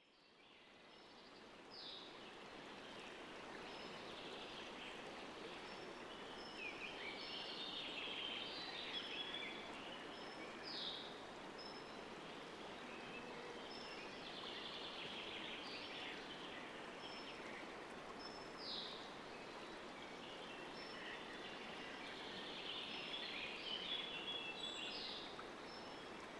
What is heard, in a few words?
Ambient Birds, sound of nature